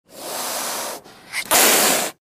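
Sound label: Ocelot Hiss Two Medium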